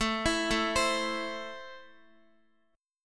A Minor, Guitar; Am arpeggiated; x,x,9,9,9,8; 4F,4A,5C,5G,5C,6E

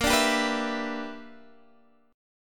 E Minor 7th Bb; Em7/Bb; strummed

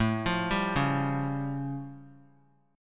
A Augmented 7th A; arpeggiated; 21,24,22,23; JGuitar

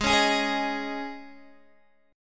Ab Suspended 4th, Guitar, DADGAD; Absus4 strummed; x,11,11,13,11,13; JGuitar

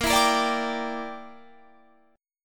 A sharp 6th Flat 5th chord

